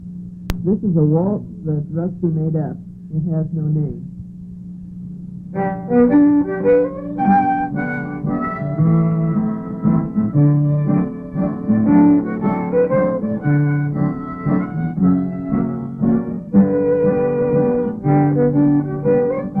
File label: Waltz